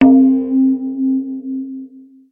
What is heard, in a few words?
error; frappe